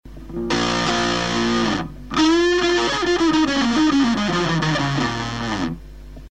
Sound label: Fuzz Face